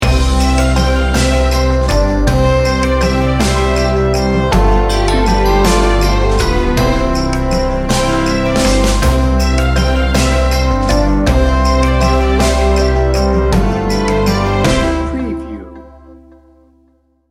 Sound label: good king wenceslas